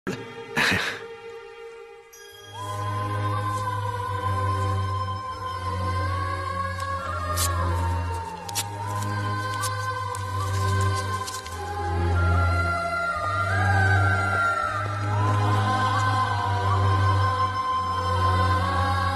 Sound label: sad bgm; padayappa